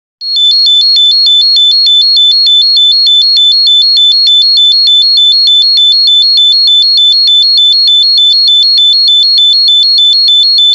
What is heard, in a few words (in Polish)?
buzzer bez generatora piezo